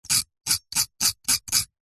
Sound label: household comb metal teeth scrape hard with sharp metal; zapsplat